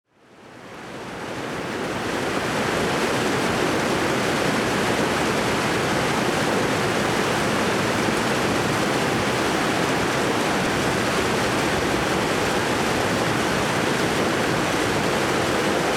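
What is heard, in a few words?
nature sounds cascading waterfall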